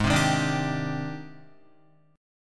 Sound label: G sharp Major Sharp 11th, Guitar; G#M#11 strummed; JGuitar